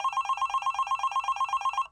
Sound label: Home Phone; zps; Ambient